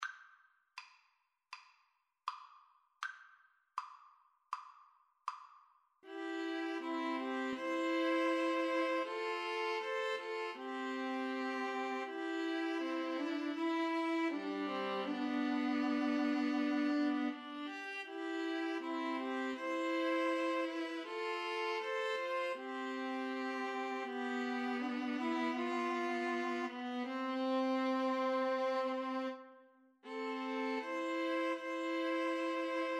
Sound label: farewell song, string trio